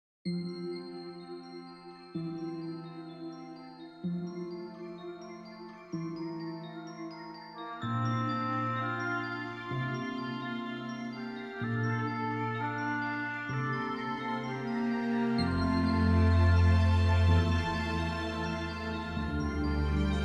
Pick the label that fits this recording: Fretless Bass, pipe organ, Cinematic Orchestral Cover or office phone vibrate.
Cinematic Orchestral Cover